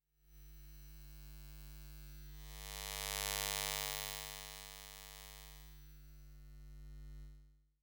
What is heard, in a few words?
Electromagnetic Fields, Hummin; electric fields